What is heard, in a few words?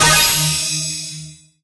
starpower shield; brawl stars